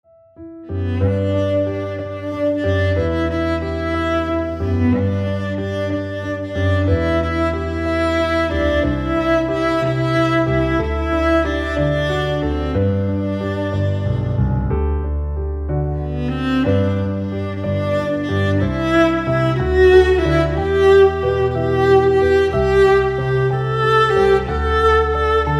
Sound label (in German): Cello Klavier